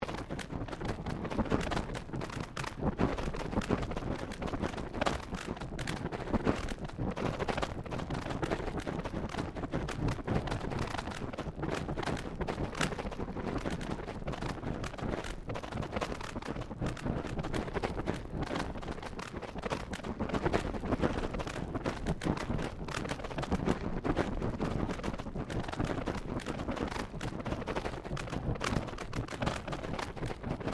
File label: Created Ambience Toxic Bubbles Loop, Artificial Sound Effects; Various Ambience created through sound manipulation in FMOD